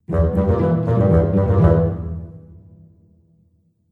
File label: Cartoon Fun Musical Element